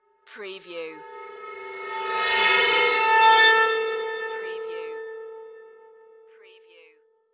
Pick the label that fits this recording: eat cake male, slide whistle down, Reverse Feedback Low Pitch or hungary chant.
Reverse Feedback Low Pitch